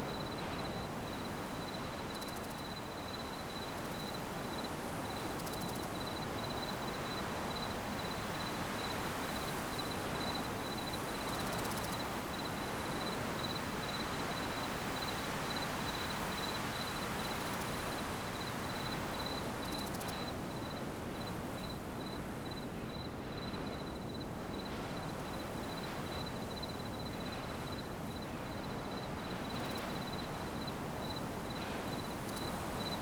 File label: ambience desert night; Rust